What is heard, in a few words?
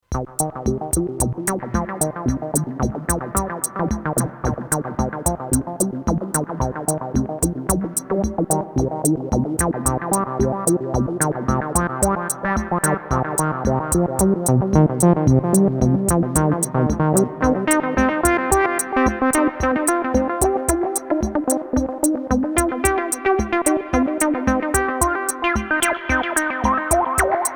SH-1000 Delay Reverb CR-78